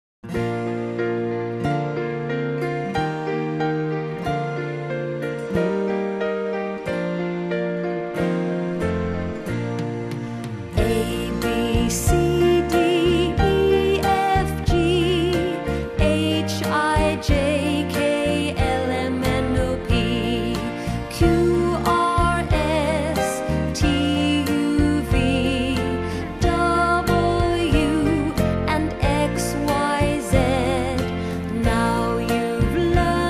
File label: the alphabet song